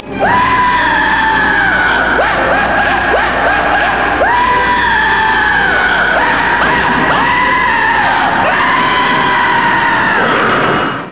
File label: screams